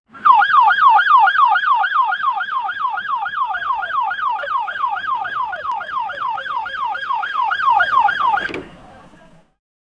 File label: soundscape from inside a, urban environment